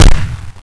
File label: watermelon launch